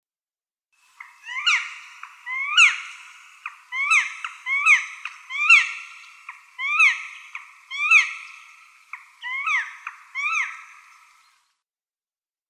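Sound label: zhelna golos, black woodpecker voice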